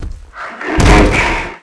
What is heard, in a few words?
hunter impacts; ambient; Half Life 2; Episode 2; outland